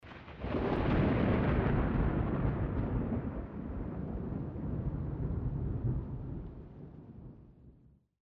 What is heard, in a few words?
Thunder Medium; zps; Ambient